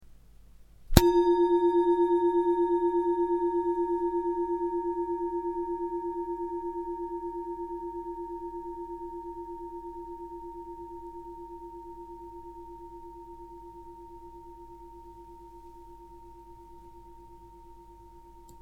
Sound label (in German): Klangschale